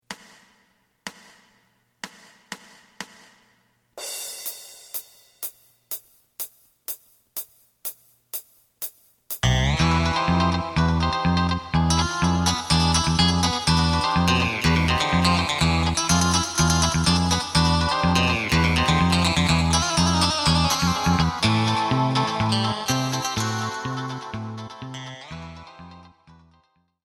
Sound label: drum